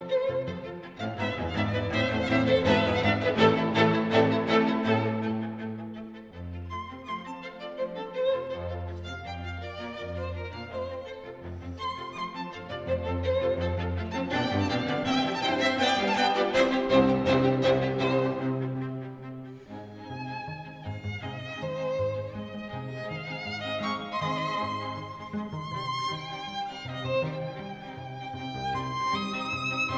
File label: Quartet; Finale uit American (Dvorak)